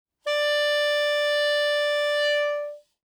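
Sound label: Soprano Saxophone non vibrato ff D4; Woodwinds; Bb Soprano Saxophone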